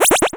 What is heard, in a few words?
Cry